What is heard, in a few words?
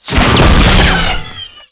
missile fire, weapons; Tribes2